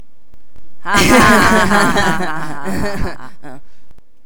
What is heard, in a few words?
Laughing